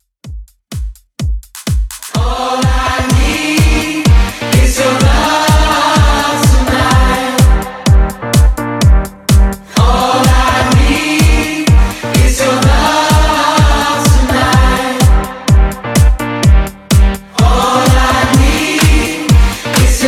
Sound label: Dance Throwback Wordplay Intro Edit 126 BPM